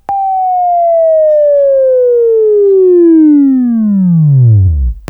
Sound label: WAV Right, Sweeping; Sweeping Training program